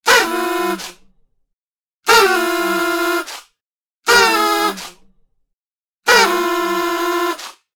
double party horn

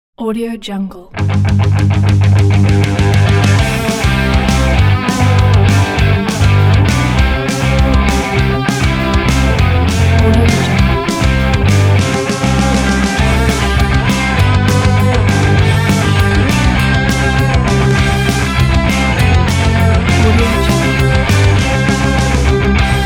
happy birthday rock ident

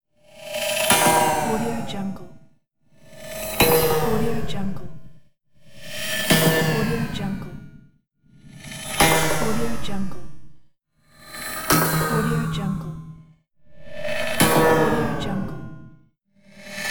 space springs

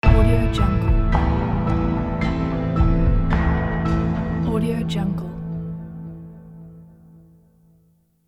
clean reveal logo

